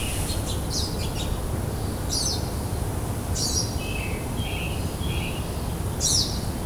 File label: Pine Siskin; Lakewood Dr